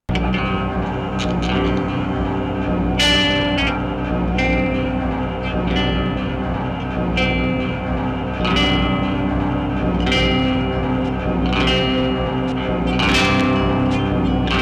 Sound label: Loops Galore